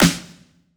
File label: snare oneshot, Pop Sample Pack; Slate